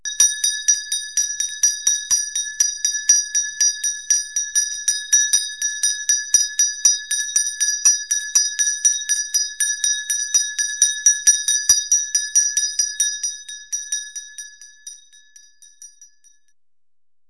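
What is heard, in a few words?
zvuki kolokolchika, small bell sounds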